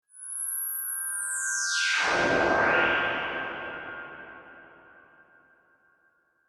Reveal SciFi Transport System, Reveal Descending Ascending Whoosh Transition; Futuristic; High Pitched Sta; Tech